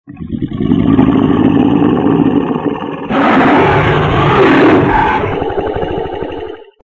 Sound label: dino sound